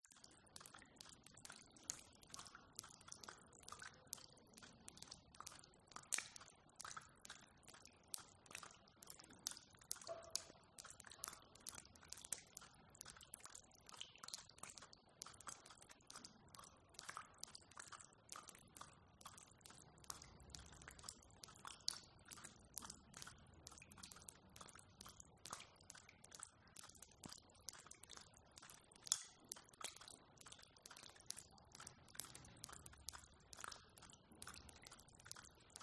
building abandoned derelict water drips distant traffic